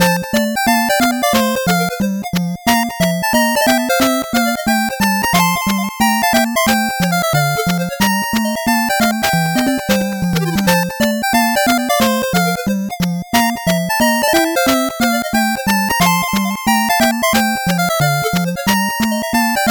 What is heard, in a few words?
8bit bgm fast